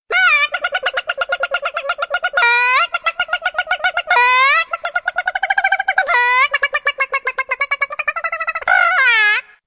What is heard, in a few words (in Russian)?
zabavnaya kurica